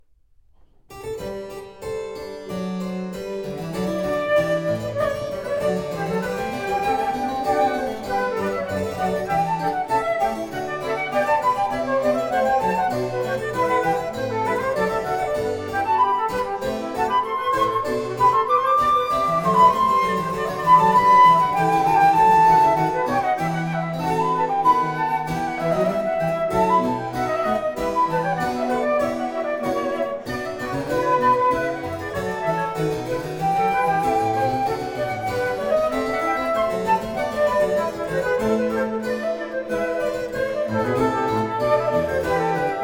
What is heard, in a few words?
Trio Sonata in G Major Presto; BWV 1038; Bach; Sonate a tre